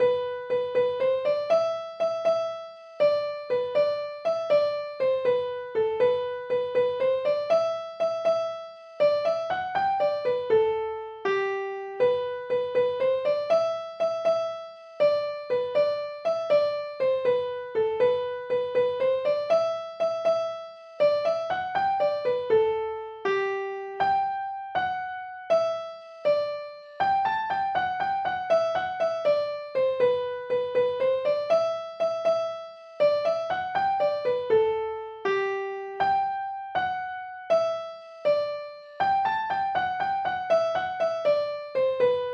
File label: march; rogues